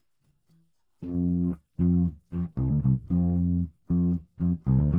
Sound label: bass